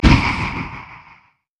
lich basic attack; karthus

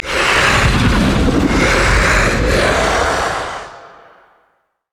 spider idle; LoL

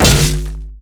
basic attack; renekton skin06

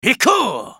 effort; BlindMonk

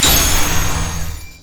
power transfer return; LoL; viktor

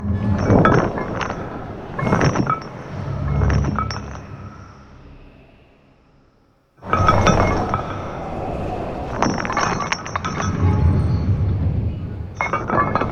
chains wind low

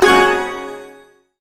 guqin basic attack; LoL; guqin sona

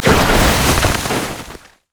inferno bomb; LoL; ziggs snowday

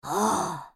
spellcast effort; Kennen; Character Voices - English

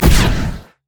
overload; LoL; ryze

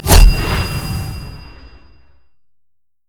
summonerspell base barrier; LoL

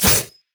basicattack; LoL; irelia

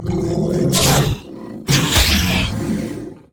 worm idle; LoL; monsters

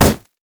mech melee basic attack; minions; LoL

